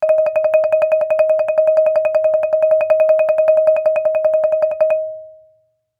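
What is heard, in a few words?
Marimba roll fortissimo Eb5; MIS Pitches - 2014; Percussion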